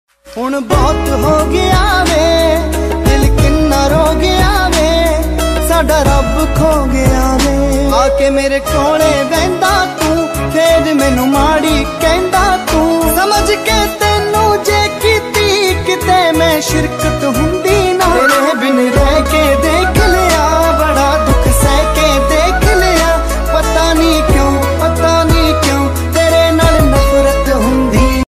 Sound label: Punjabi Ringtone